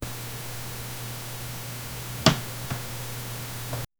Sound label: someone who takes four ph, camera